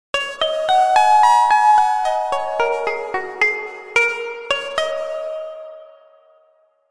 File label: koto melody ringtone